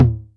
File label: TEKK, Electric Tom, Yamaha DTXpress